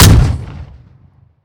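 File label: dpapa12 fire player, weapons; gmod; iw8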